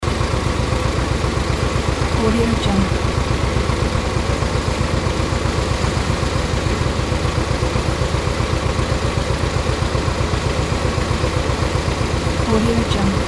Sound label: tractor engine loop